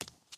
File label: run step l concrete; Ben 10 - Hero Time Game; ThrillGames